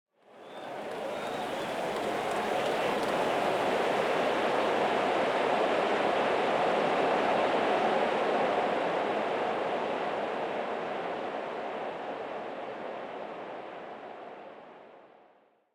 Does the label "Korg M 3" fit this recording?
No